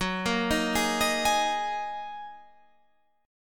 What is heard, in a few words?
Gb 6th Add 9th Gb down Guitar Half Step Down; Listen to Gb6add9 arpeggiated